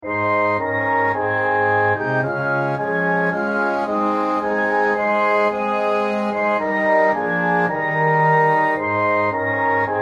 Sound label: Orchestra, Hymns